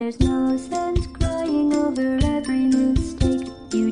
win; garrysmod; ragcom; portal

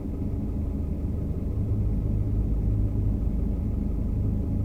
car idle; vehicles; garrysmod; sincar

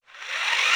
spin up, minigun; garrysmod

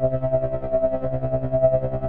door loop; garrysmod; doormod

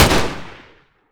ak47 fire; garrysmod

